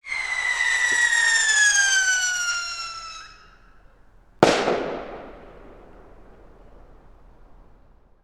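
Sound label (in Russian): звук фейерверка ракета летит вверх и взрывается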